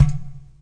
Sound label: clay pot hit